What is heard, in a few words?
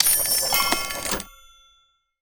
shatter record